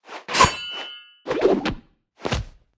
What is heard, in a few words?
Anim Ultra Barbarian, 动作音效; 野蛮人; 部落冲突; 皇室战争